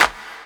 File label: CLAP, Dance Drums